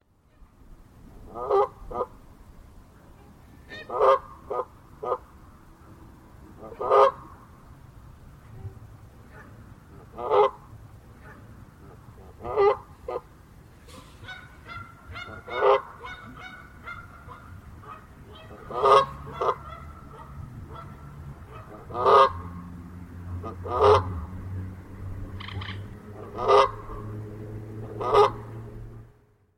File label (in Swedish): Kanadagås